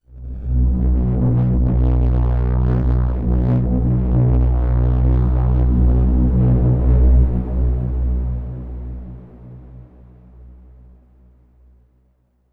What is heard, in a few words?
Overlay Drone